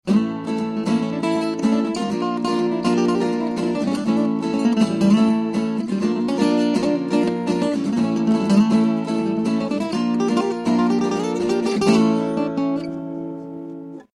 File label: nw-20cbk motiv espanolax